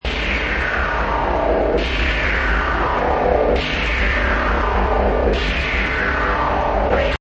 Horr Atmo, Atmospheric horror loop at 135 bpm.; Horror Atmosphere Loop 135 bpm; Synth Loops

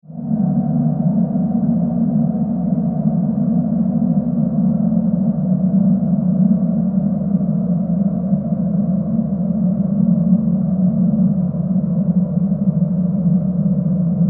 Ambience, Alien, Cave, Wind, Drone, Scifi; 5.1

